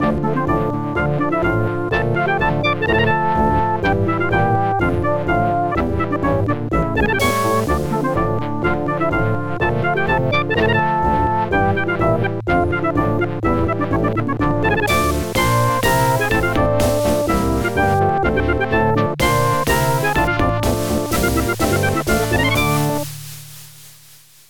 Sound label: OPL3; tetris classic